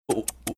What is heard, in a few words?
making mouth clicking sounds